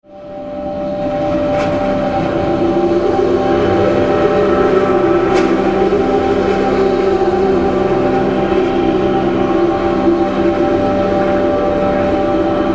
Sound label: Walking